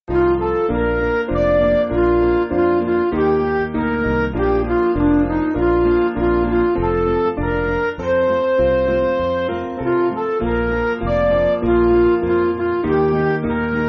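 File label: Midi, Music Backing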